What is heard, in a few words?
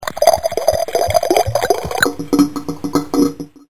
drain